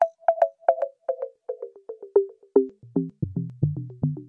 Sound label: echo bops; SYNTH AND ELECTRONIC LOOPS